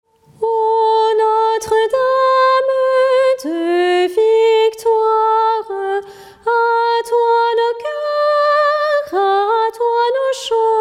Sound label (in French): Chant